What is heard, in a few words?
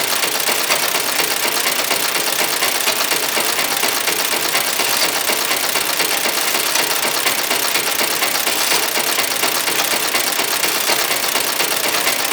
Metal Gear Spinning Loop; Ambience; Props; Gemini; Warframe; Entrati